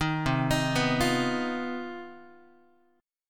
C Minor Major 7th Double Flat 5th C down Guitar 4A 4E 5A 5D 5G sharp; arpeggiated; JGuitar